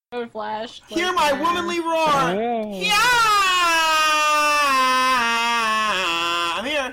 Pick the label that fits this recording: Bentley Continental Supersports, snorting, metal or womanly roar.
womanly roar